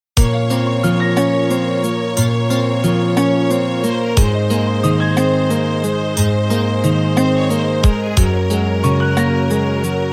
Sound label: Melody